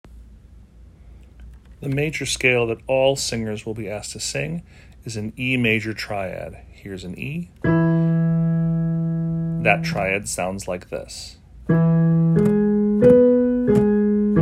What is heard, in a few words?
Major Triad starting on E